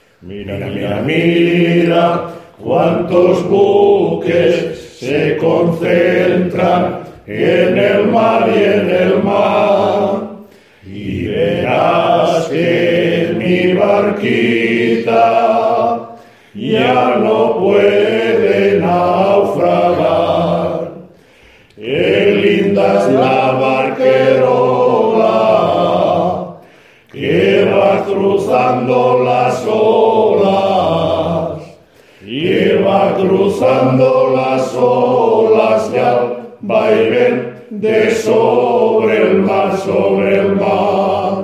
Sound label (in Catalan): Barquerola